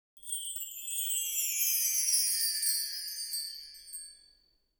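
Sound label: wind chime down; パーカッション; サンプリング素材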